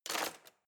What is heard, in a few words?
knives wood drawer place